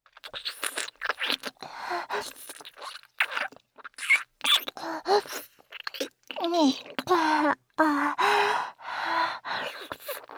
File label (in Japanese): 舌に吸い付くキス